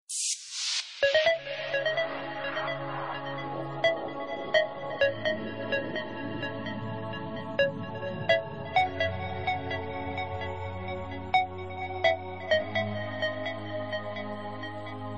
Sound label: Sms Tone